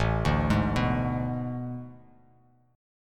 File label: A Major 7th Suspended 2nd Suspended 4th; AM7sus2sus4 arpeggiated; JGuitar